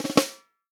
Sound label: REAL DRUMS, POP KIT; Best Service ProSamples vol.43 - Real Drum Kits